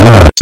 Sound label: nut but loud